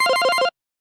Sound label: Exec Ring, Business Rings